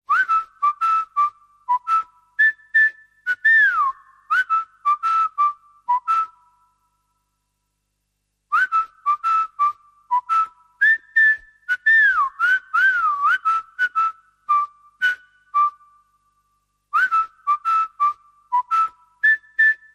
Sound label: Whistle Only